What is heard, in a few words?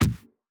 hit fire; Learn to Code From Zero With Godot; fire ball; bullets